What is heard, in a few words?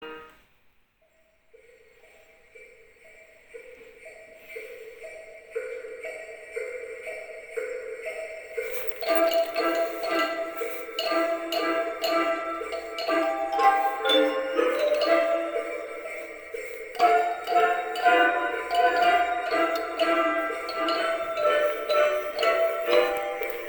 Jingle Bells